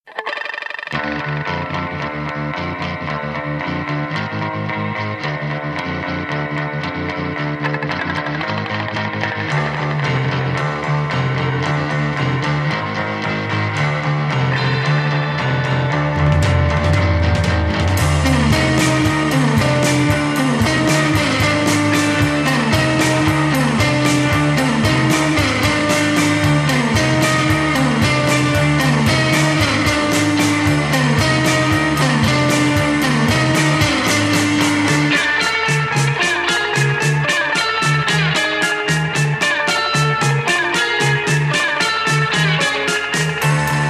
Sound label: Tremolo